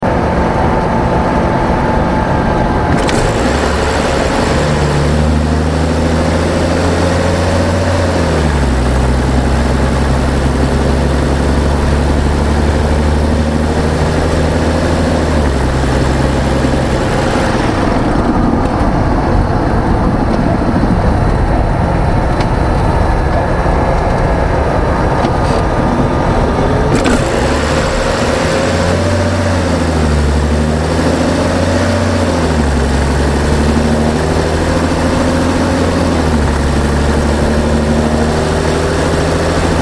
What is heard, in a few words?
Hybrid 3 Acceleration